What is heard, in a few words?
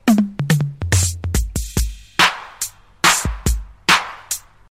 Drums Mix